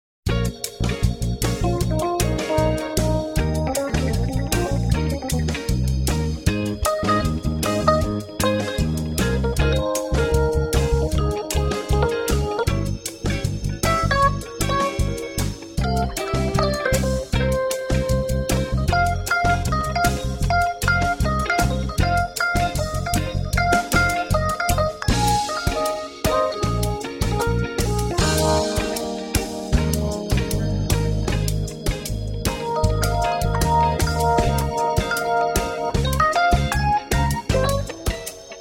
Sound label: Reggae Jazz Music; Cafe Atmosphere